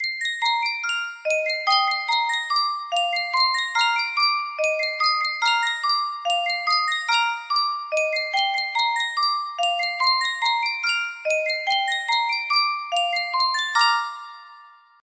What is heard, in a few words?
yunsheng music box, melody